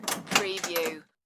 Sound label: Door Latch